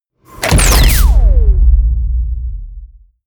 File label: promo pad; whooshes-rises; hits-impacts